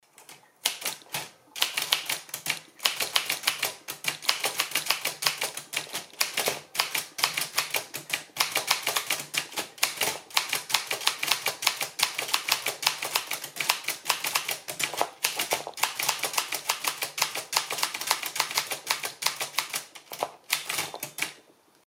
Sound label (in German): olympia plana, Schreibmaschine; Hörbeispiel Olympia Modell Plana A